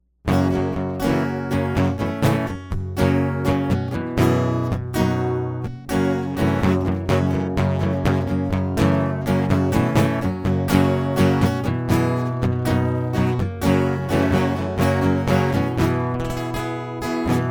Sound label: acoustic; Enjoy the Sky Tonight